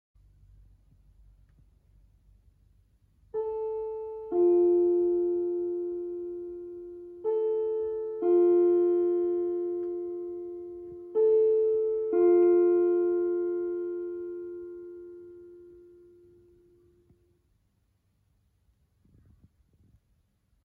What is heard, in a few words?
chime yokohama